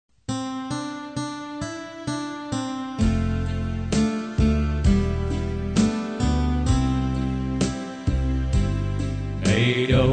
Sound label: bass